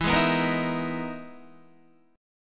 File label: E-Major Sharp 11th E, Guitar; EM#11 strummed